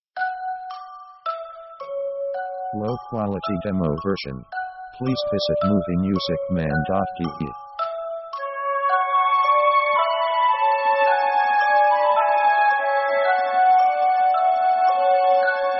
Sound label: orchestra broad fantasy; orchestra themes